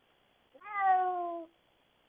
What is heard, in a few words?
cat meow